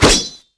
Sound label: SWORD